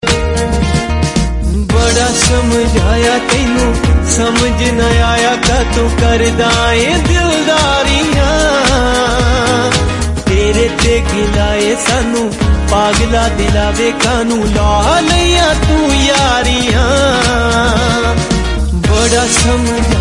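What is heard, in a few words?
Punjabi Ringtone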